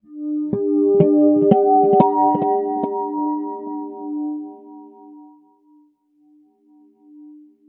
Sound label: Guitar FX, Stringed Instruments, World Pop